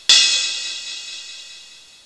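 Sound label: Cymbal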